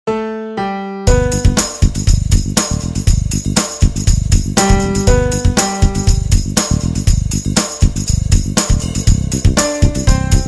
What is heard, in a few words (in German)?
Jingle Projekt; steinhundert